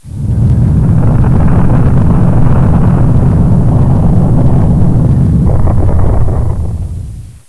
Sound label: earthquake